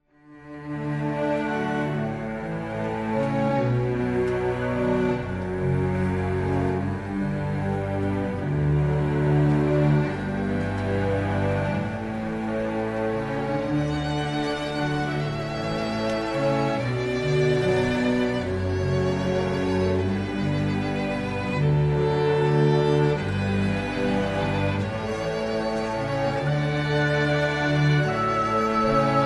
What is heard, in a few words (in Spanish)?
canon pachelbel grupo